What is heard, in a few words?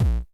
hc kick, hardcore kick